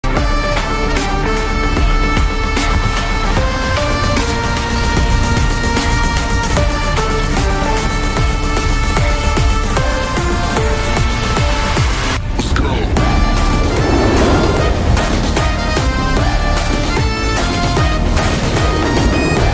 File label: BGM RINGTONE; THALAPATHY 68 ANNOUNCEMENT